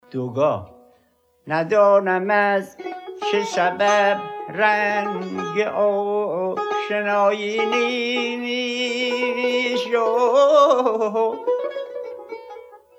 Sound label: Dogah; آواز بیات ترک; دستگاه شور; آموزش دستگاههای آواز استاد دوامی